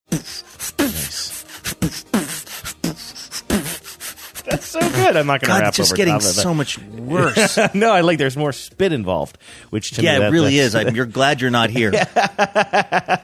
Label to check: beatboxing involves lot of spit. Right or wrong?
Right